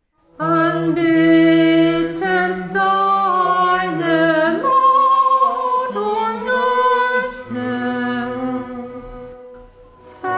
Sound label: Anhang Alti